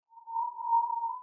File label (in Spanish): vaca; st-gallen; gais; choir of species